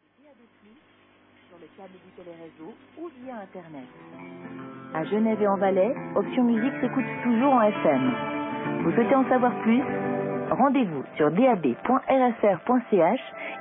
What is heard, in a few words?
loop musique; friburgo